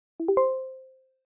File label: check Mark Audio; Apple; iOS-18